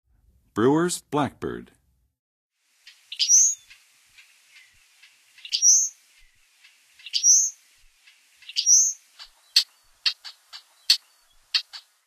Brewers Blackbird, Songs of Birds of North America; Western USA; Western USA Songbirds